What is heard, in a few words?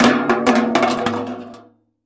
Aluminum Gutters Dro